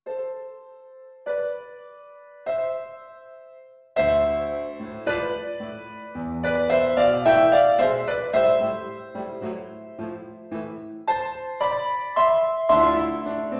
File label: Tango Habane